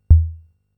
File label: Wasp-Drum